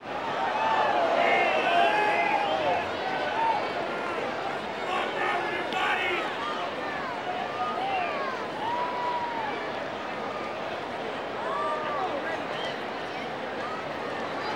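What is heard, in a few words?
baseball crowd ambience chatter; Crowds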